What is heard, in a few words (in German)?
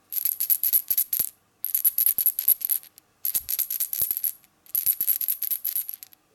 Rassel